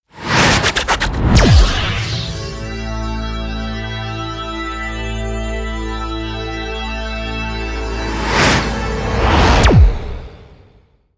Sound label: promo pad